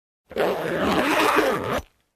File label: Luggage Zipper close